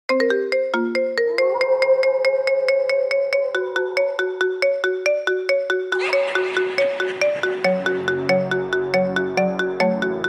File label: Marimba, zil sesi